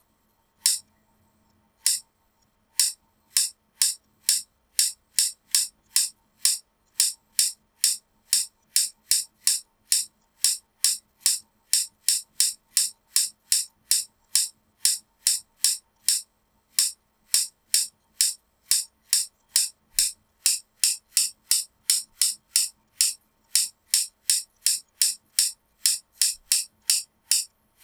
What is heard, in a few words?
Tempo clicks; Perahia